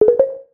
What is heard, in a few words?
dl complete; Xbox 360 dashboard